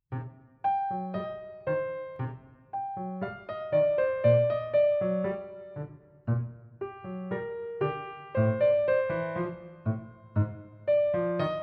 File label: piano